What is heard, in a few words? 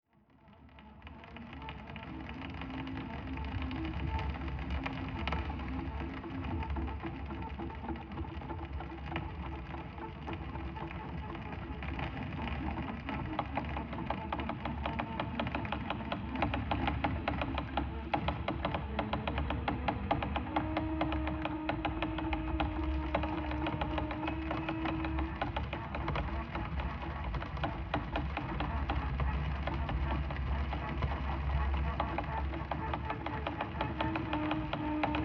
rooted anxiety F phrygian 80 BPM